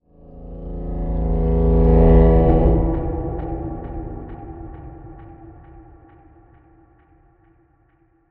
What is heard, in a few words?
Impact